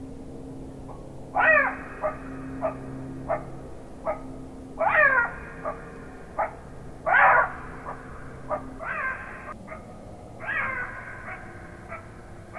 Black-backed Jackal

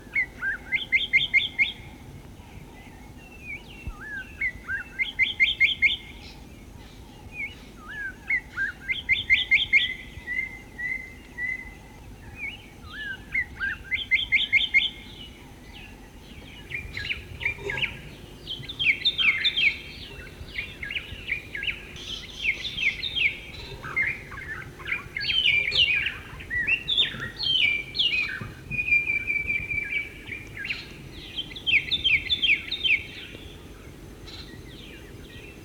Cichladusa guttata Spotted Morning-Thrush

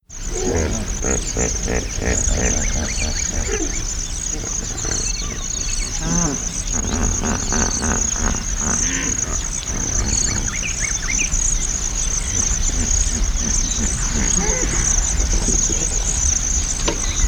Hippopotamus

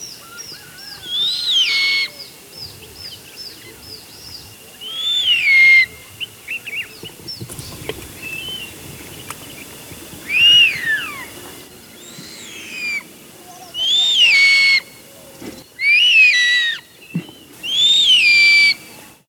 Bubo lacteus Verreauxs Eagle-Owl

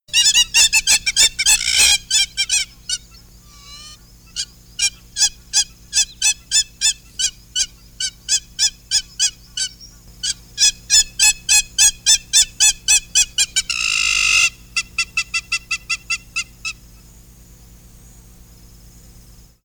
Scopus umbretta Hamerkop

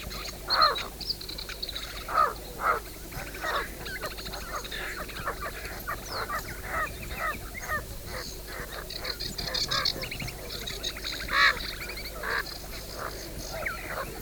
African Spoonbill; Platalea alba

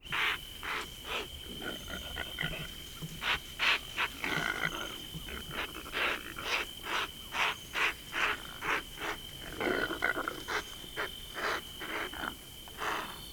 Impala

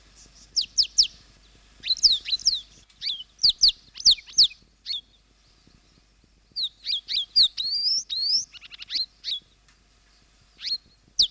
African Pied Wagtail; Motacilla aguimp

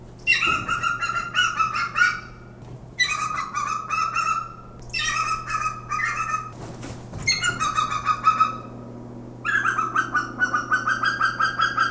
Verreauxs Eagle; Aquila verreauxii

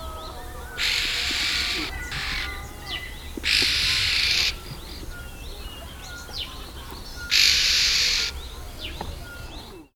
Red-billed Oxpecker; Buphagus erythrorhynchus

